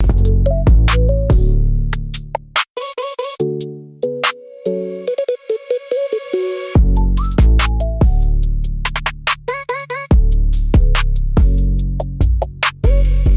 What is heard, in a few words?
loop; bgm